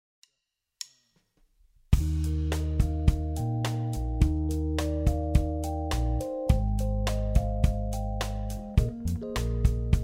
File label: 105BPM Eb